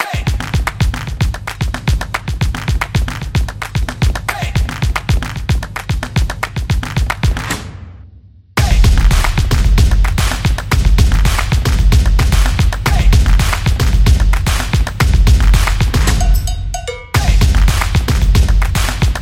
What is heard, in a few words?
Energetic Drum; high energy